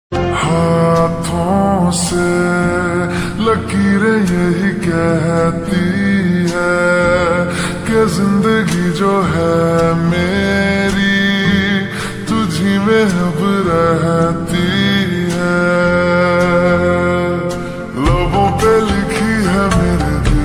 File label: Ringtone slowed reverb